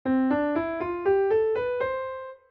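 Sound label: scale C major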